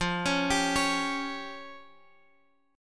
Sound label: F Suspended 2nd Sharp 5th F down Guitar Open F; Fsus2#5; arpeggiated